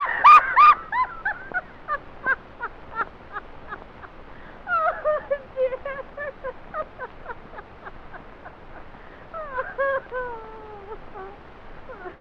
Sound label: Laughing record; variety stage